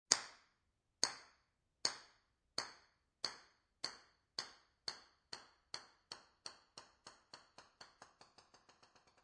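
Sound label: Golf Ball Dropped Bounces To A Stop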